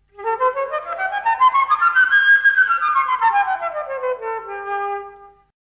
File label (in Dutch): Houtblazers Dwarsfluit laag hoog laag